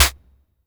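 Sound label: vermona drm1 mk3 snare drum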